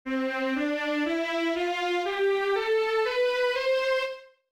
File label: Musical (String Scale); BW